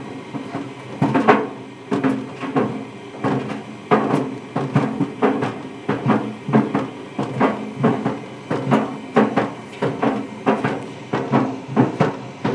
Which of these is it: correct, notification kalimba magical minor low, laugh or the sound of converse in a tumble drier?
the sound of converse in a tumble drier